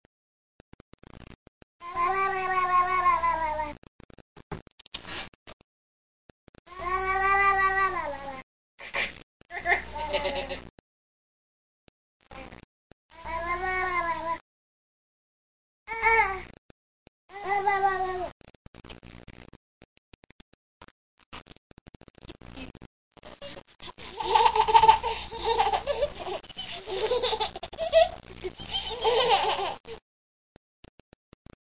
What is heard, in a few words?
woo woo woo